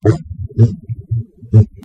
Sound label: pit drone idle, goblin; svencoop; legends